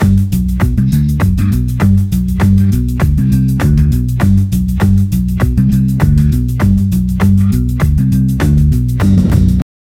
punchy bassline, danceable

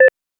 sound for ending audio call, call end

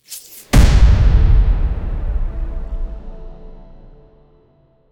thema teatro orchestral, theatre

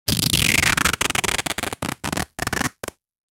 package reveal tape tear

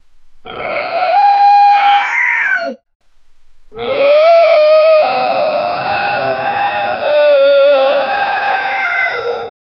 the beast crying and shou

